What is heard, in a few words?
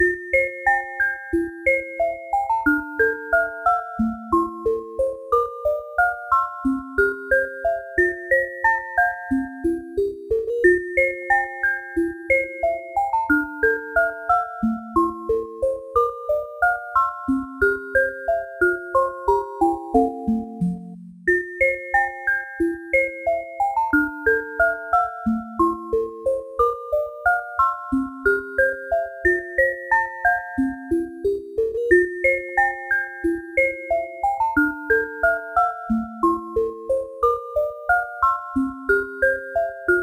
Music Box; My Little Pony: Pinkie Pie's Party